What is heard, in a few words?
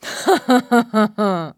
Woman Laugh Low